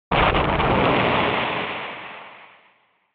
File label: Retro Anime Explosion Low Short